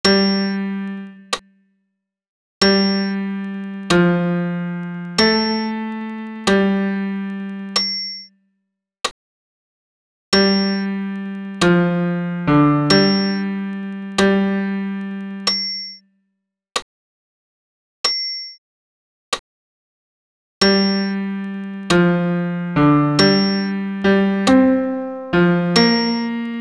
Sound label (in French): pian seul; Crucifixus